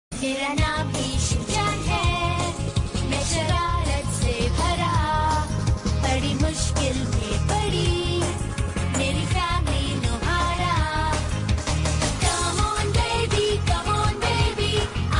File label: cartoon song tone; shinchan